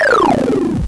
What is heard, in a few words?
Robot